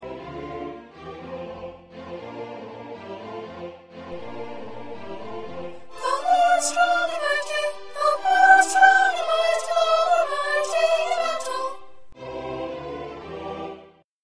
1st Soprano; Lift Up Your Heads

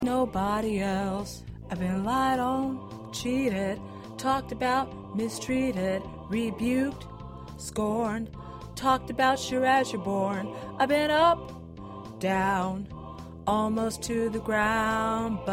Baritone

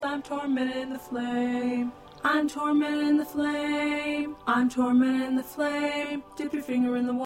SATB Tenor 2; rituals and congregational songs

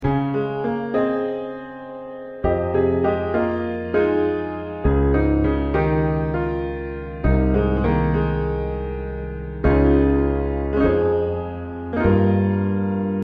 SATB Accompaniment

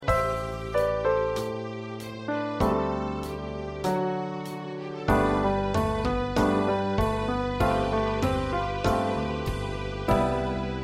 Accompaniment

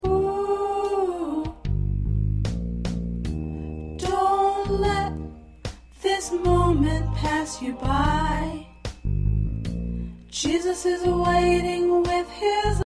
Soprano

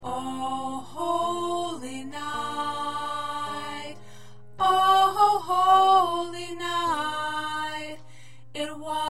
Alto, choir parts

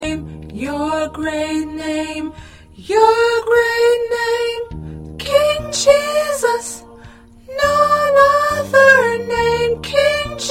P Soprano

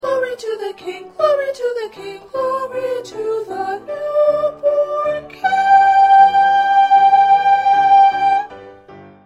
1st Soprano

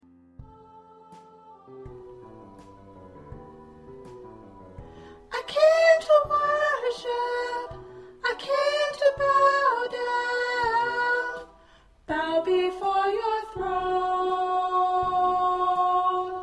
Soprano

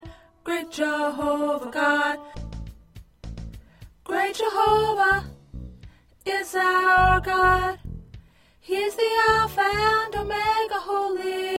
Soprano

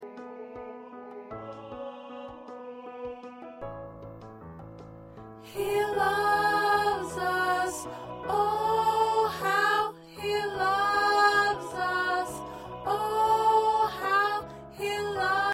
Alto, choir

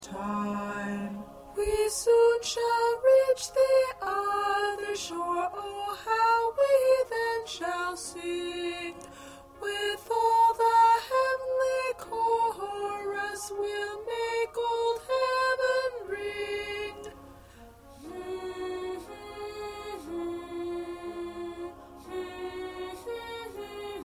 1st Alto

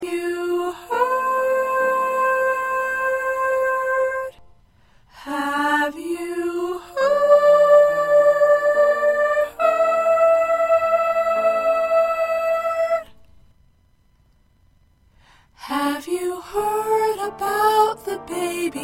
1st Soprano

